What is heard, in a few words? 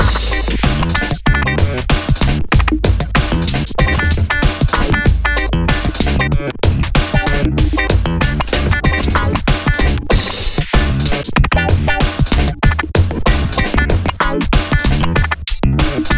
rythm